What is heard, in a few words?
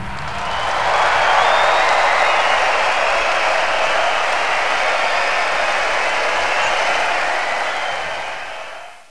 applause